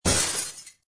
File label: glass break; Casanova